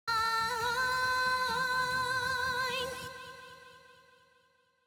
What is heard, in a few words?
vocals, 130BPM; Pony Fan Music Remix Stems